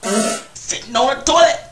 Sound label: toilet fart